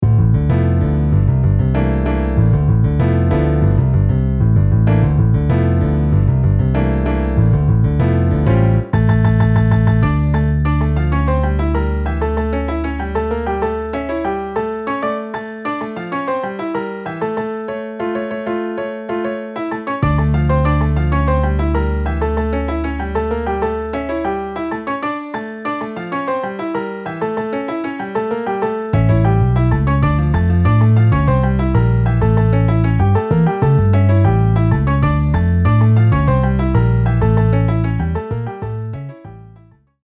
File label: Piano Bass MIDI